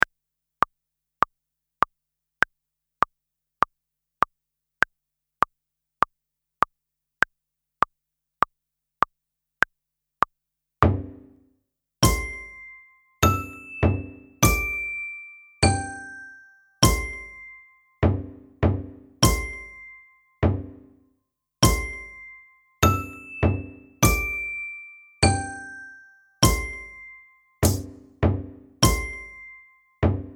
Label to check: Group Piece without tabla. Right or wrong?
Right